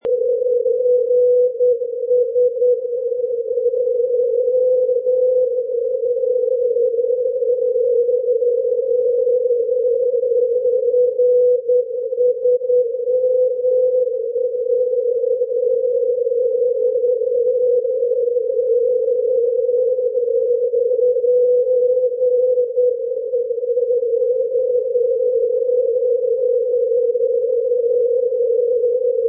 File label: ndb, regional; mikkeli; julussdalen-kiwi